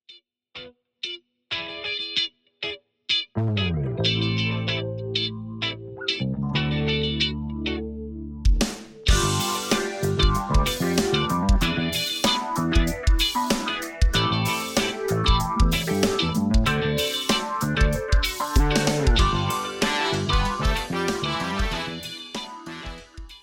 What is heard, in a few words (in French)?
guit elec et basse